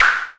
Buchla clap; E-MU Formula 4000 Series Vol. 2; OrbitPercMaster

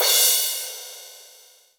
ROCK CRASH; Greytsounds VOL-1

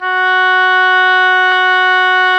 OBOE; Sound & Vision - Gigapack I CD 2 (Roland); Brass&Woods1

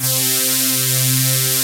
TECHNO; Pro-Rec - Synthsonic; Z1 TECHNO

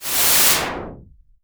IMP WHOOSH; Cinematic Impact

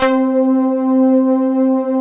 pan plucks, synths; Pro-Rec - Synthsonic

